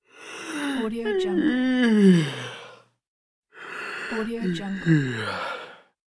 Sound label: yawn